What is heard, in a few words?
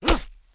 horseman attack; propack